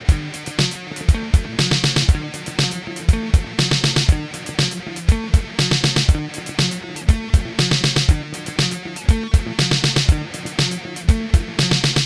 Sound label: Guitar Riff